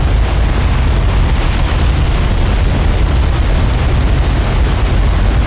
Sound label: Tsunami Loop; Destruction